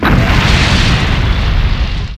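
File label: Rivet Hit Interior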